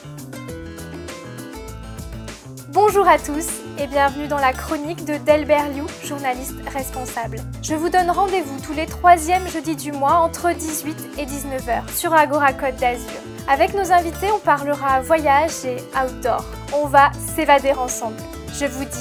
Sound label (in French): jingle présentation chronique